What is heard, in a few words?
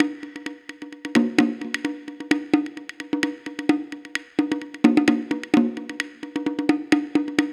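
percussion mix 130 BPM, right; INIS - Opium; PERCS 130BPM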